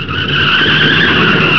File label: skid hi; TRANSPOR